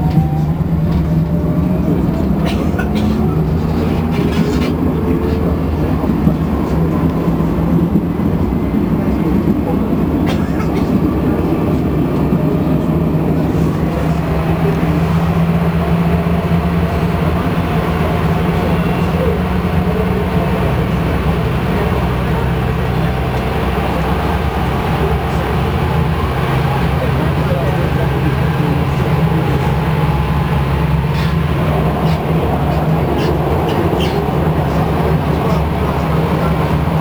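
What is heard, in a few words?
UK train in carrage, interior; ENV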